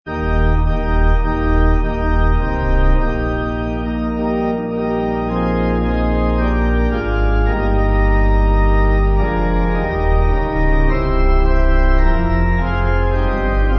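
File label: Pipe, Pipe Organ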